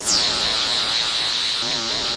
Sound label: electric and annoying; Electro and Synthetic